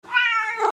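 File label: cat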